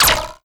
bolt huge zap

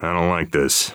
scared emote; Counter Strike Model Pack; CS:GO; phoenix coop

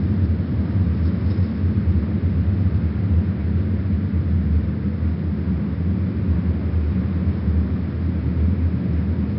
wind bass; ambient

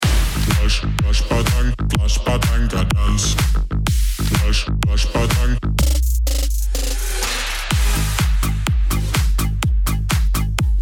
round ten sec count, music

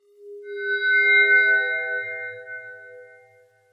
ambient abstract metal evo; Half Life Model Pack

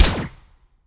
blaster small shot, energy pistol; weapons; Half Life Alyx

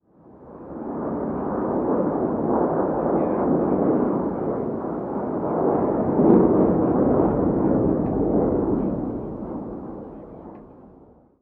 jet distant; animation jets; Counter Strike Model Pack; CS:GO

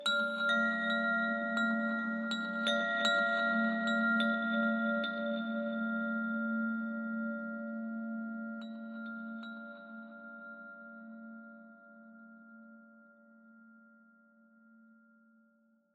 ambient abstract chimes high; Half Life Model Pack

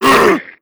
attack pre, zombie vox; creatures

